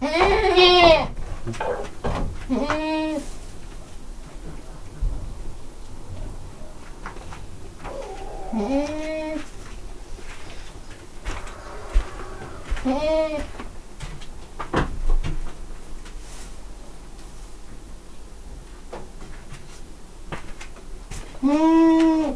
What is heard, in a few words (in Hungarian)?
kecske hangulat; állathangok; paraszt udvar; házi kecske